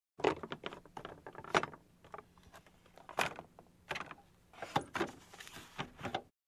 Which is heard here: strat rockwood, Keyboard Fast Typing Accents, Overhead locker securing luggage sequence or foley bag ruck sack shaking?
Overhead locker securing luggage sequence